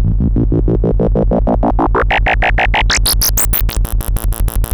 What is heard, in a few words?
MOOG A2, Synthesizer; Hollywood Edge; Giorgio Moroder Rare Synthesizer Collection; MLTI.MOOG